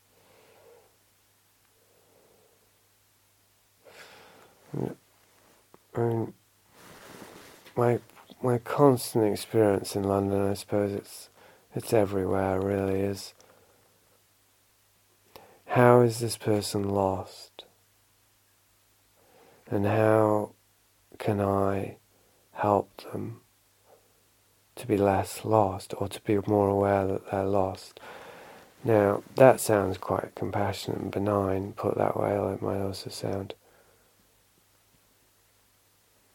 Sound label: human instruments